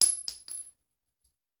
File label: Bullet Shell; Sound Ideas; Guns